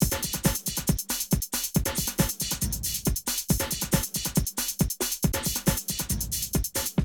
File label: Drum loops, Rave & Hardcore; Zero-G Groove Construction (1993)